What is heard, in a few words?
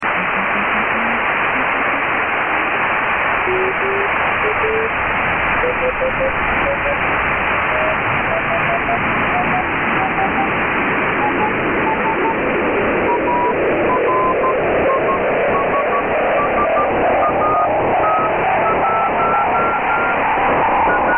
CW and BPSK; arissat-1; 145mhz920